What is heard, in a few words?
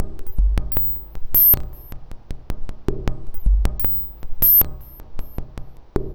Mod Loop, Modular Percussion